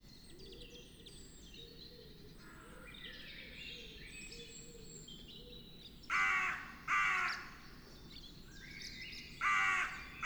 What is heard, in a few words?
Carrion Crow Calls Exchanged Between Individual And Group